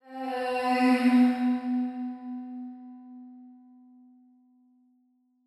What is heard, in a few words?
130bpm Am, Vocalpack; Vocals